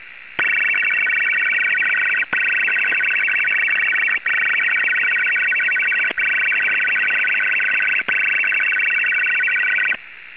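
Chinese MIL 4FSK 400, CHINESE MIL 4-FSK 100Bd 400Hz; CHN-MIL-4FSK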